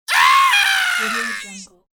male death dying scream

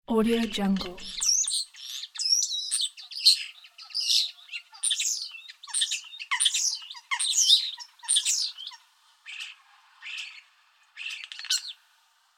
starling singing

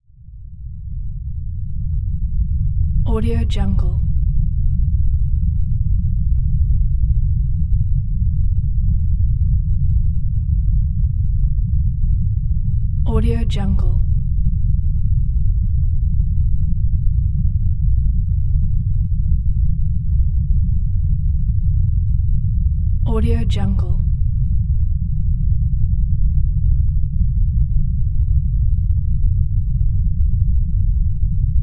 earthquake rumble; غرش زلزله

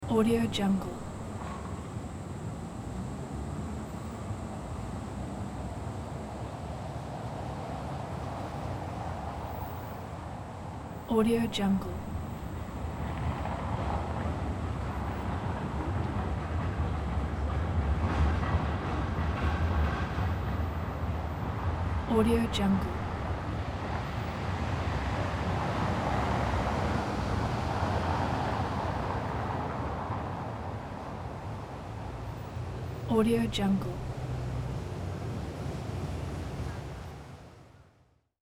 city urban large intersection traffic

